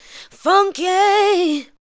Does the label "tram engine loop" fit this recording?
No